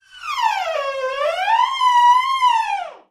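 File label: door creak small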